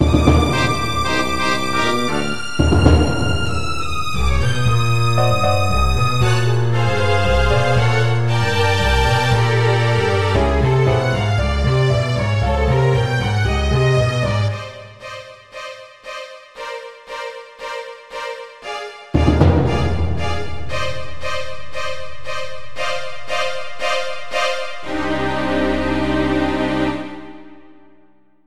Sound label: horror suspense Loop